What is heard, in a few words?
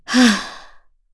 Sigh; FreyB; kingsraid